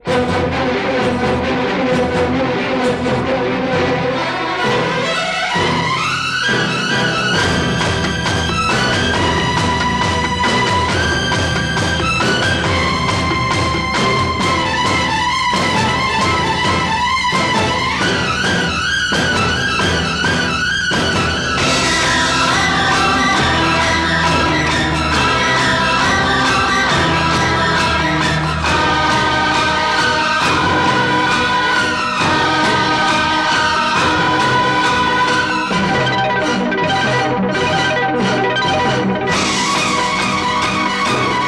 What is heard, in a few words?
Music